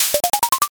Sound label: board flip